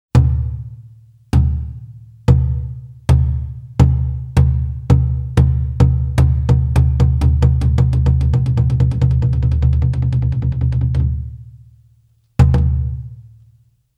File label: Drum Roll